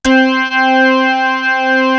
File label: CLK ORGN, organs; East West; Global Sounds